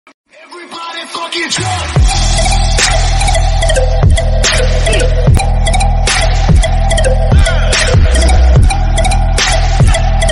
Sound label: attitude ringtone english ringtone